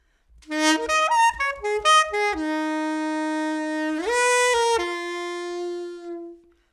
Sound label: sax soprano classicpop